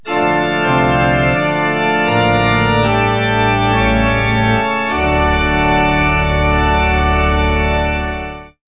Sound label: Organ Bridge; KABOOM!; Music; Pianos & Organs